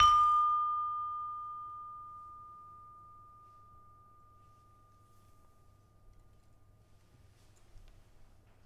Peking, gamelan

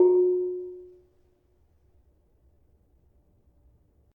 Barung 4 F Sharp, gravity harps tuned

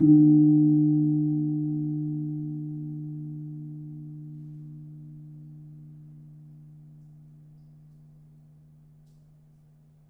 Kempul, gamelan; gravityharps; timbrebox

